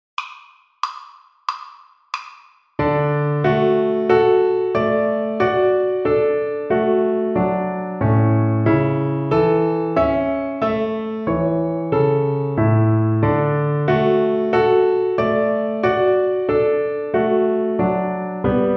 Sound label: Piano Accompaniments